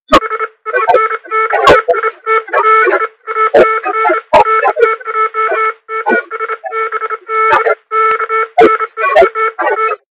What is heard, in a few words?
Morse Code